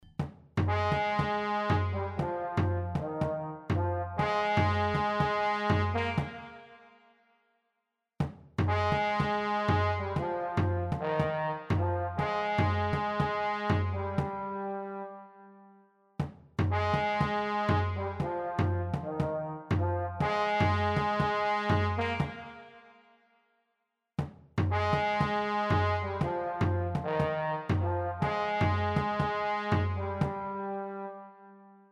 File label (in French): trombone